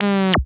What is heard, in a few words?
Synth Sound; Vengeance Samples; VEE Synth Shots